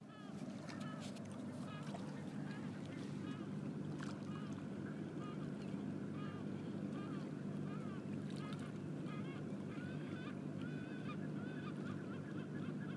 gulls water lapping and the engine noise from a passing boat; laivastopuisto